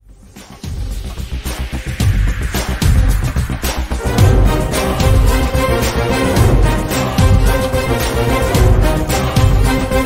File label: BGM Ringtone; Vikrant Rona; Kannada